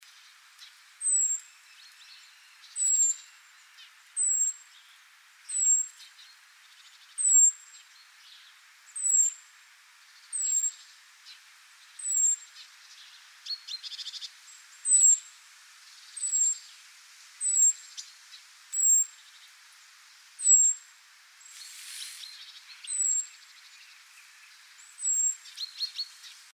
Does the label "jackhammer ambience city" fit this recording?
No